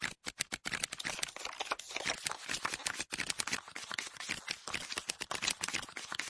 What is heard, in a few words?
intro card deal; freecell solitaire